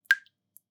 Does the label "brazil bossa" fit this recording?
No